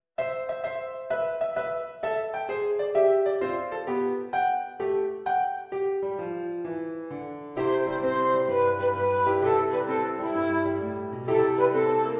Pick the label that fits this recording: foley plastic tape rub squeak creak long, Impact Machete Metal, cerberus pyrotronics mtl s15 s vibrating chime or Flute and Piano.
Flute and Piano